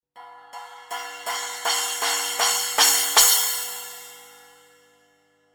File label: 10 I Splash crescendo hits, cymbal